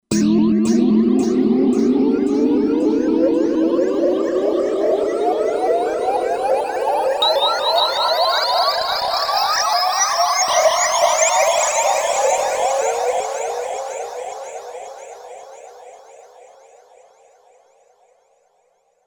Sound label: Time Walk, synth